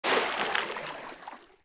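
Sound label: water oars up; Civ4 beyond sword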